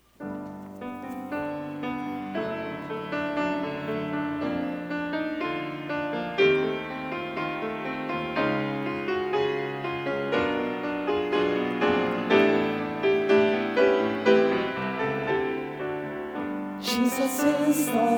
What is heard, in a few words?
with vocals